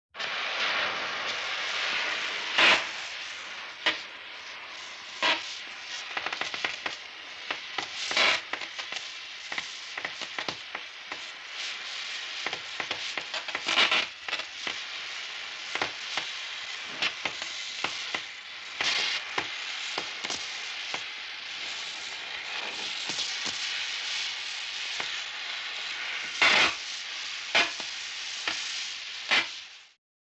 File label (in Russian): Payalnaya lampa